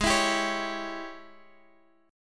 G sharp Major 7th Suspended 4th Sharp 5th, Guitar, Half Step Down